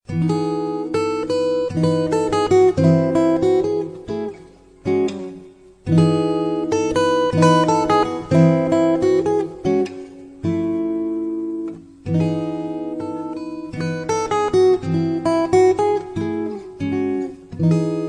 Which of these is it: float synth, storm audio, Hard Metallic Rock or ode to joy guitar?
ode to joy guitar